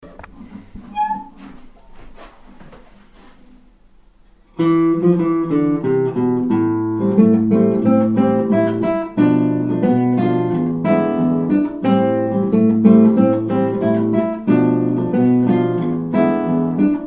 guitar duet

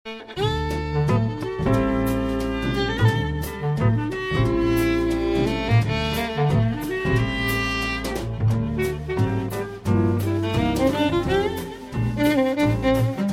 strings

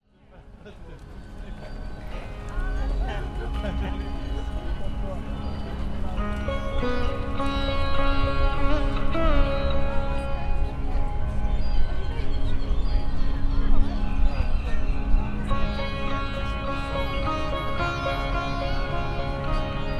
sitar player with rain and baby seagulls; at brighton pavilion

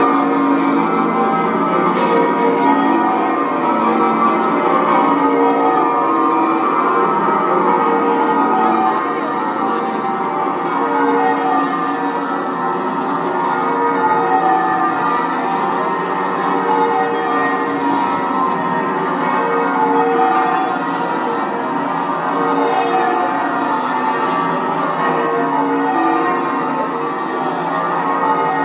deafening bells; over pa as queen leaves

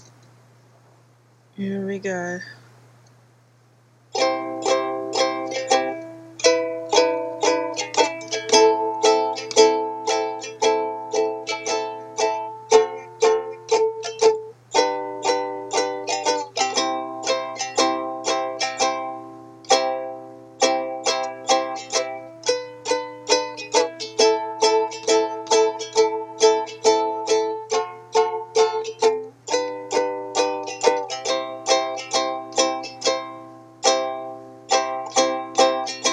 practicing my ukulele

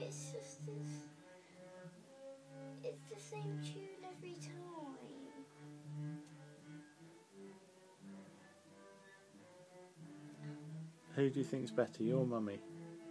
takes up the cello; children perturbed

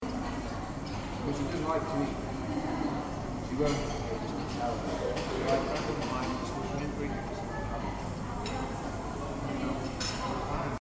cafe; UEA Norwich

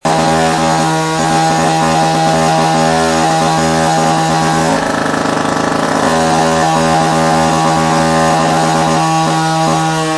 incredible sounding powersaw near the office

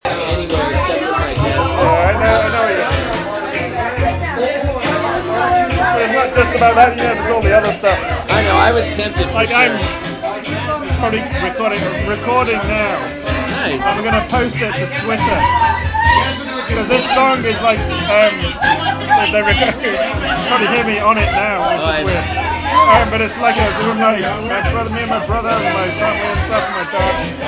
at party

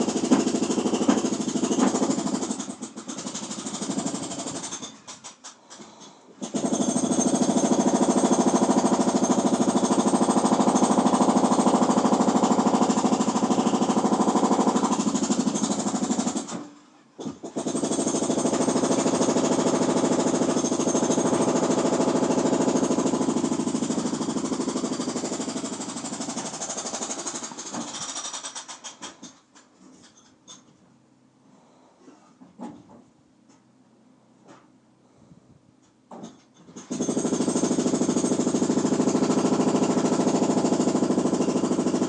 conservatory noise

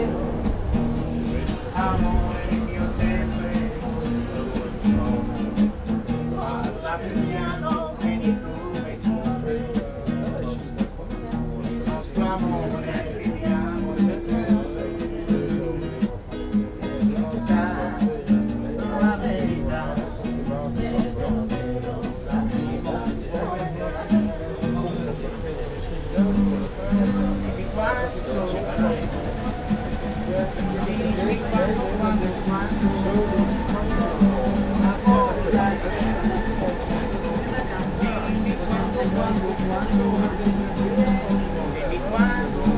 subway music

